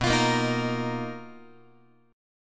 B Major Sharp 11th, Guitar, 4A,4E,5A,5D,5G sharp,6C sharp; strummed